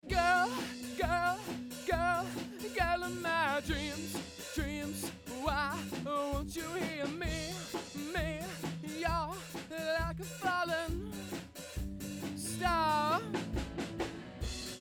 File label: Vox Focus Backdrop